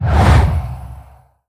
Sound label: Mana Shield on; Dota 2